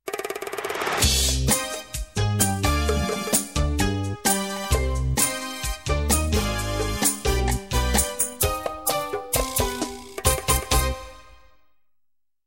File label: chacha